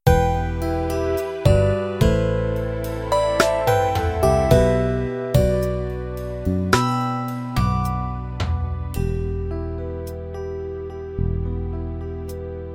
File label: 80 Movie Ballad, rhythms; mzx500; preview pack 01